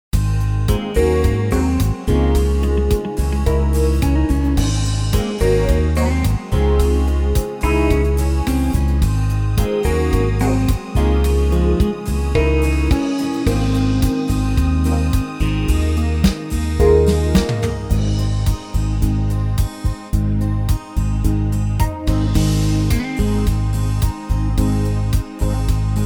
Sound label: 8 beat